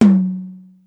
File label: High Tom, Yamaha DD-35